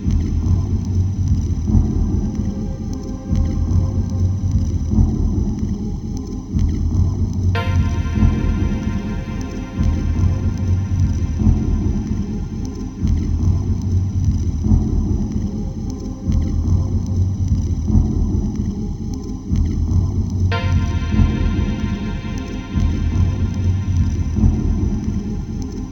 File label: loop; biosphere